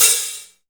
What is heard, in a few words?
treble heavy open high hat sound c sharp key